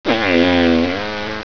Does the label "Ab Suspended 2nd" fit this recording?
No